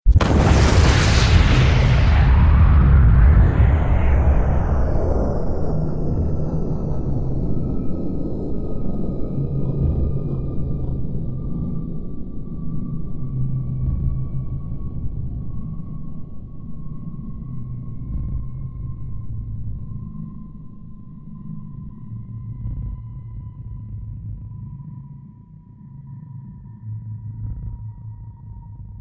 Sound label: Nuclear Explosion